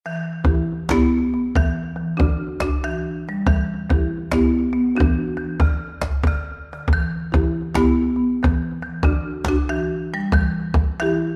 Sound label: ave marimba iphone 14